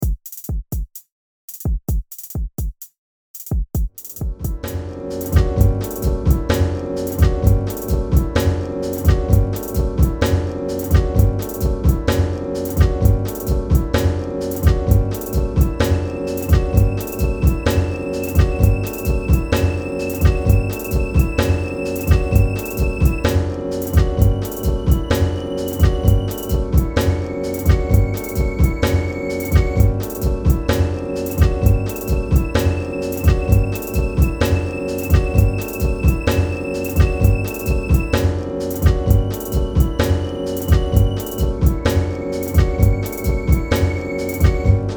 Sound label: 129BPM